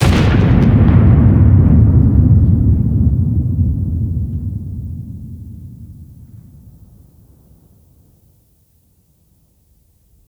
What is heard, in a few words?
Explosion Classic; Redmatch 2